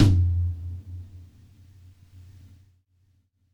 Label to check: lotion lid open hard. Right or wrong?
Wrong